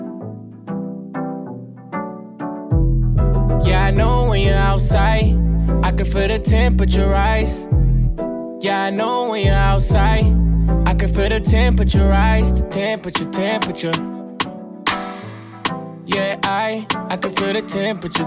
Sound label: English Ringtone